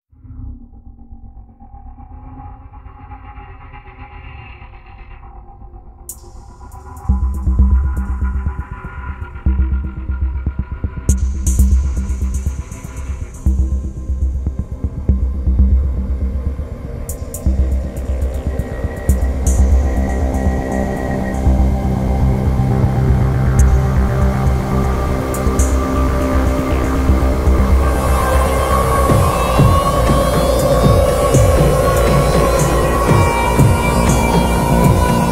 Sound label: zerg music; starcraft2